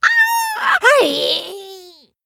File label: Gnoll Death; Thirsty Drifter